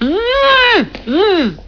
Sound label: buzz